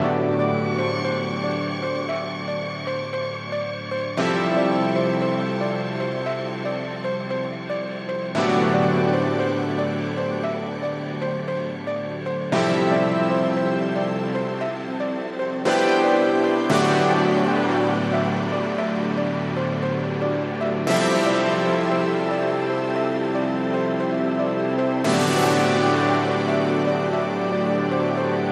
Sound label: Ambient